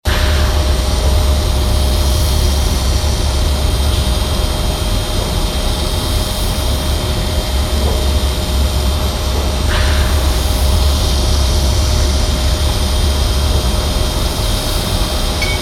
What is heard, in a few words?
HMS Belfast engine room binaural mix